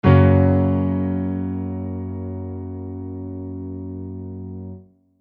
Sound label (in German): temperiert fis dur